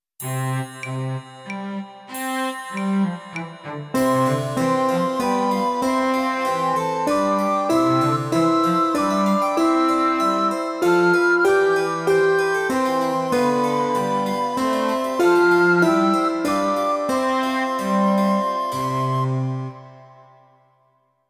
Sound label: Petite Minuet